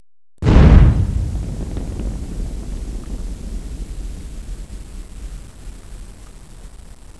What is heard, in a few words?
EXPL; explosion sounds